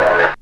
Synth Sound; Vengeance Samples; VEE Synth Shots

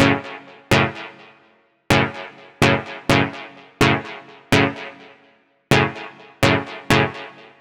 126BPM Chords Root F#; Vengeance Samples; Malta Kit

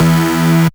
Synth Cuts; Vengeance Samples; VES1 Instruments

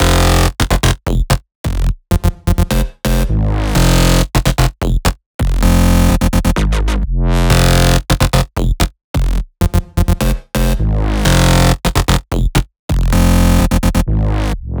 Cutter Synths Root F, 128BPM; Vengeance Samples; Cutter Kit

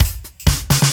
Fill Ins 128BPM; Vengeance Samples

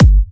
127BPM Kick; Vengeance Samples; The Mission Kit

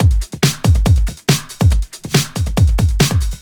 Loops BB 140, BreakBeats; Vengeance Samples

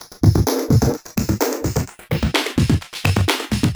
VFX2 Loop 128 BPM

